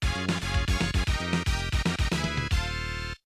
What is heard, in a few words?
unused jingle; Super Goal